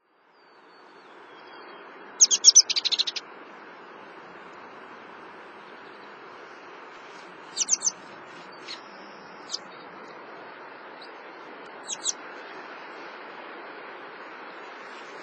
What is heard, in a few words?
American Pipit; Birds